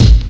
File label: rinkydink, Kicks Loud